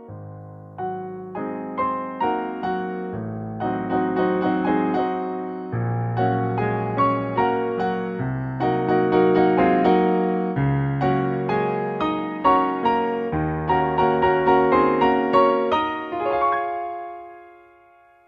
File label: concert grand piano; clair de lune prelude; claude debussy